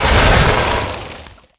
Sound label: Spirit Thorn; Gamigo